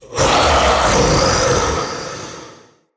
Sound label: Mockery Male Vocal Attack, Enemy; Mockeries